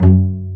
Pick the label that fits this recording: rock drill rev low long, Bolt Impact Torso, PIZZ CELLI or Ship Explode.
PIZZ CELLI